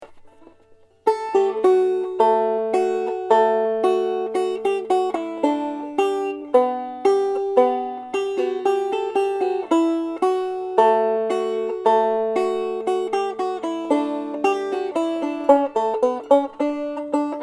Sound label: Liberty melody